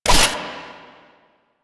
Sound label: Shotgun Quick Reload Layer; Weapons; Warframe; Pax Duviricus